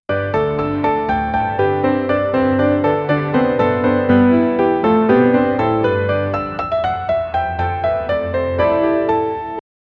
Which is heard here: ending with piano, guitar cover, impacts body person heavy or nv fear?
ending with piano